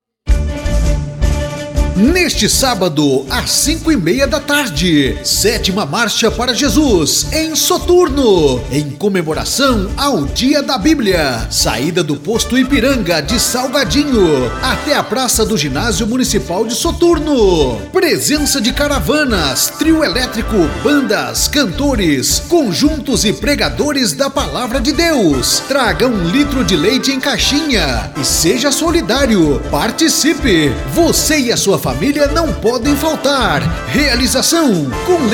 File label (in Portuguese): vinheta soturno; vinhetas gospel; marcha para jesus